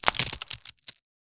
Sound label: small steel ball split